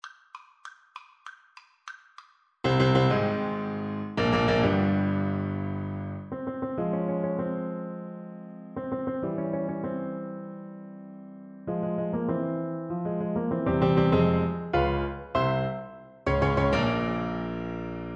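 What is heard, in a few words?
symphony VLC karaoke, Cello; beethoven; symphony5 1st mvt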